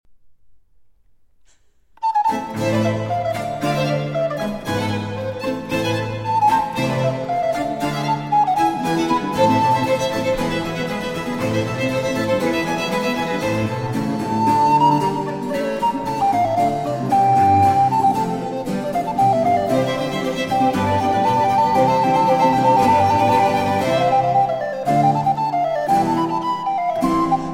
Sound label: Concerto For Recorder, 2 Violins & Continuo in A Minor, Allegro